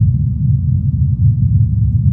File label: engine; Privateer